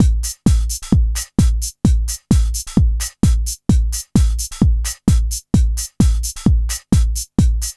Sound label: TECHNO, Funky Xtreams; INIS